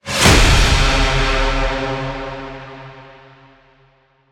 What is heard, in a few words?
Trailer Impacts Low Horn Hit; Sound Response